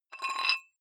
dumbbell thread take off weight soft, fitnessstudio